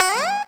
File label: Guitar Twang; Zero-G Jungle Warfare 1, 2 & 3; Guitars